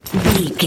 foley soldier gear equipment metal cloth heavy movement strong